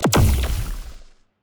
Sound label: evasion buff hit, weapons; Stellaris; biogenesis